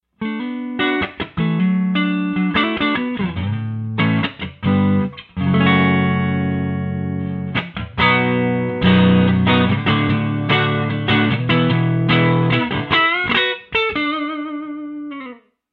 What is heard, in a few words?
clean